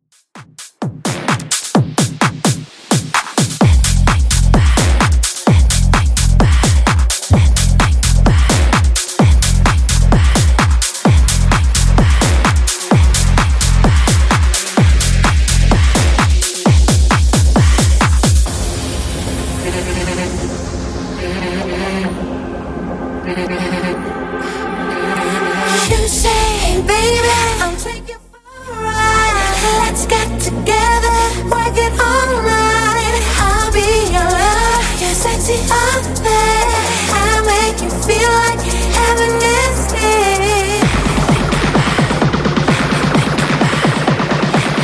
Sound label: House Hype 129bpm